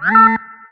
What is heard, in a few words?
Boss Warning